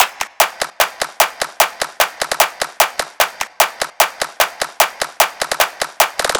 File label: Clap Loop - 150 BPM; Cymatics; Light Free Sample Pack; Light Samples